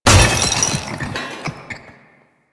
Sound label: Loot Crate Medium; Warframe; Entrati